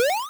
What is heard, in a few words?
pickup success, square waveform; Square Waveform sfx